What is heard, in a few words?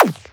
fireball Sound